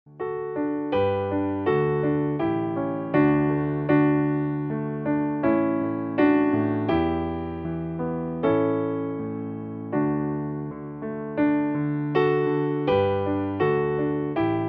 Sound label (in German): Playback D-Dur